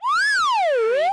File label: CARTOON SLIDE WHISTLE